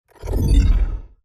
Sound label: glitch; base pack